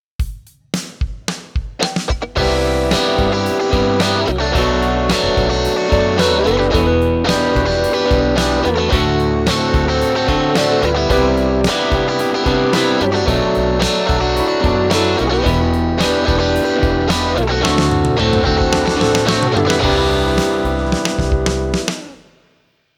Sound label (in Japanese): ODドライミックス; boss gx1